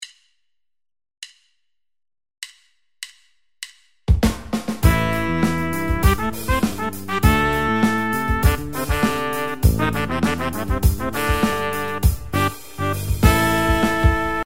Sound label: pop